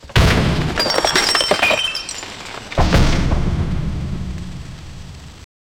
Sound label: loud impact sound with, crash